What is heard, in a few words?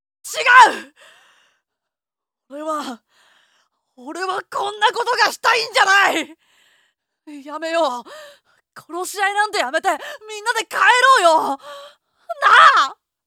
rain